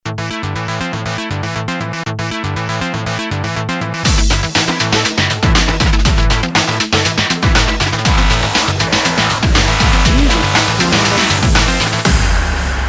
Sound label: stinger